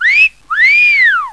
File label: whistle